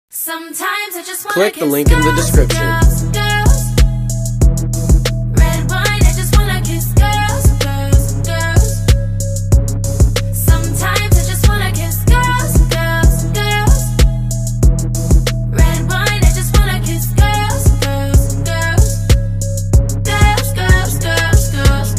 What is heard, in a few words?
iphone girls ringtone